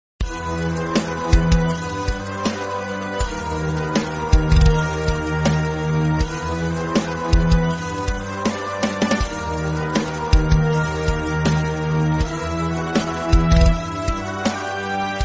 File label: Climax Bgm Tamil Ringtone; ringtones; Kantara